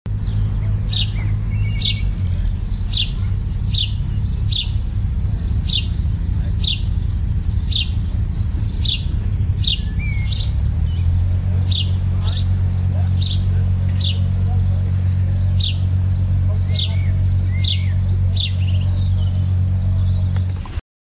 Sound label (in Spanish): Gorrion; Gorrión Común (Passer domesticus)